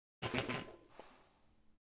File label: shut, ships